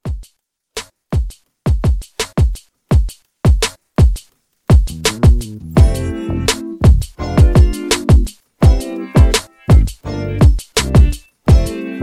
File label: 80s RNB Re Drum